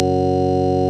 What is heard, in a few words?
CLICKY F#1, clicky organ